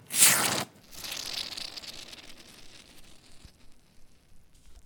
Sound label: chirrp